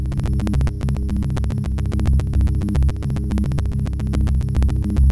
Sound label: buffer fuct loops